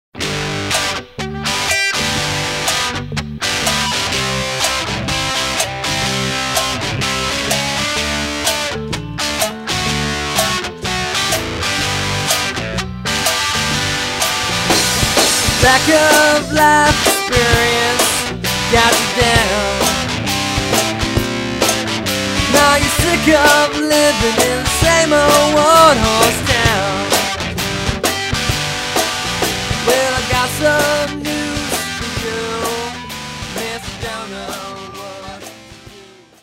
Second Hand Guitar; Smuggling Plums; Project M

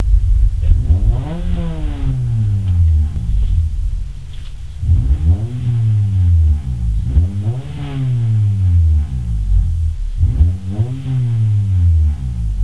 Silenced inside reved, Exhaust

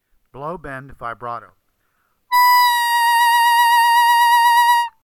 Blow Bend Vibrato

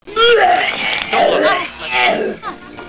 vomit; caddyshack

